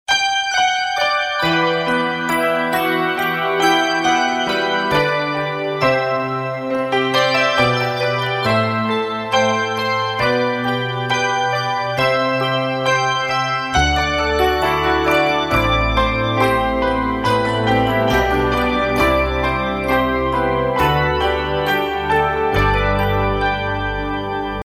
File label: europion piano